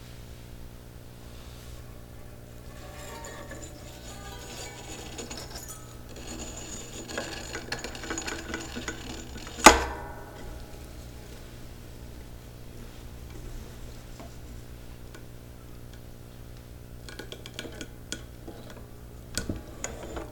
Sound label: zither bells